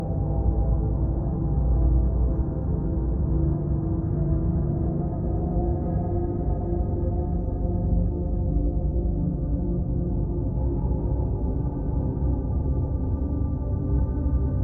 Waiting Room Ambience; neurowake

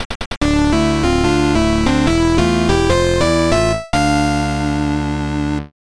Level Complete

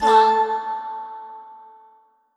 Organ Note; Mario + Rabbids Kingdom Battle

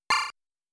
Hit Sound; Beecon; Motos

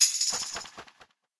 Butterfly Click

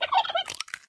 Turkey Egg

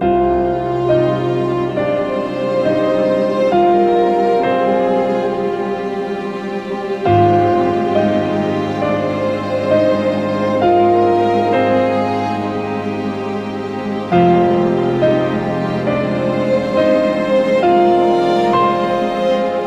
Dungeon Fail Loop

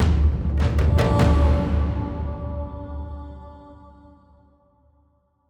Foot Chase Balcony stinger; Yemen

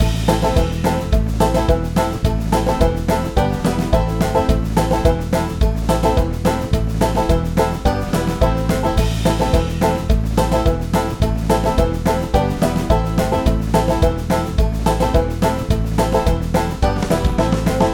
Original Theme Reworked (Drums)

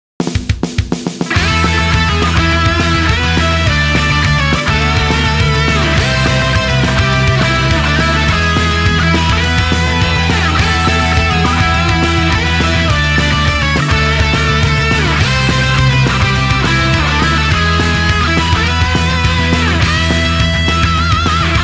Music event

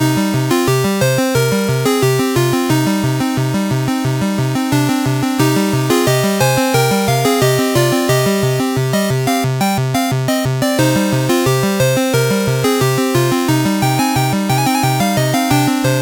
Area 1 Castle Music; Bebop and Tempo

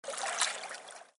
Splash Sound; the worlds hardest game 4

